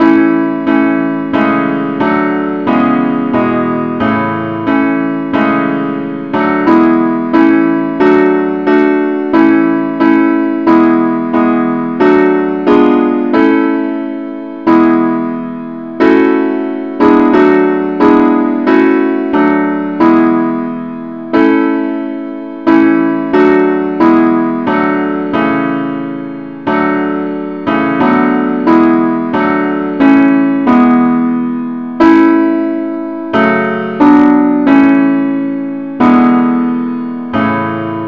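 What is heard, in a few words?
advance accompaniment key a; christe Sanctorum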